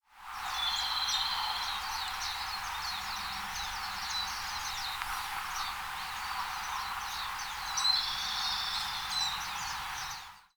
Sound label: komadori, bird song